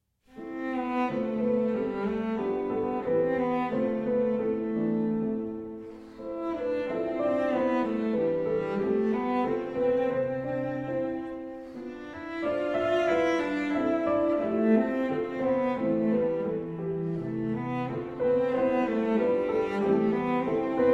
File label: Pieces For Cello and Piano, Sans Vitesse et à l'Aise